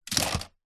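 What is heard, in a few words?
leisure toy drop into toy box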